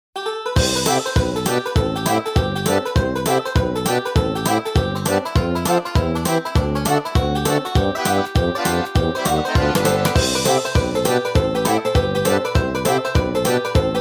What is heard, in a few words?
Comical Music; arc the lad iii original game soundtrack